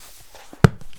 Book Close; SFX Kit; Foley - Misc

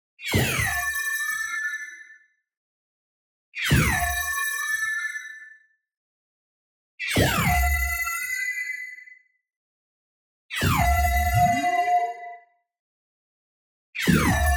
TONAL Resonant Feedback Ringer; boom library; Anime Essentials

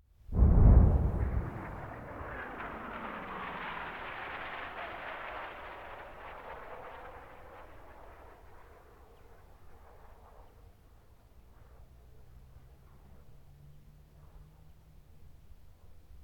Artillery Cannon Artillery; Sound Ideas; Guns

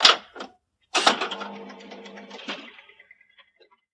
button press; rokola; DeadFright

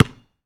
Street Ball Bounce Asphalt; SFX Kit

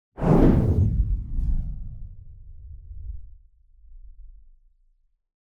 Whoosh Soft Explosion High Sub Energy; Hollywood Edge; sound designer's toolkit v2

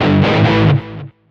Pickup Guitar Riff; SFX Kit; Pickups

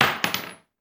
Bullet Shell; Sound Ideas; Guns

